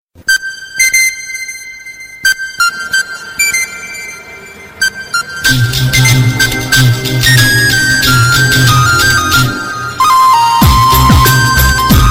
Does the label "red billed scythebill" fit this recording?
No